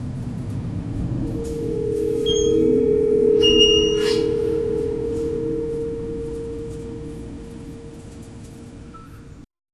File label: spooky halloween doorbell